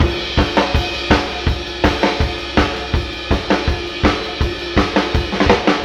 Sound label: Drum loops Funky